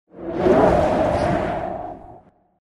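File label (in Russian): порыв ветра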